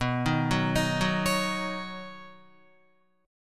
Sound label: B Major Add 9th, Badd9 chord, Guitar; arpeggiated; JGuitar